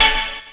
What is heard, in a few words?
DR. LOOP; X-Static Goldmine 3; CHORDS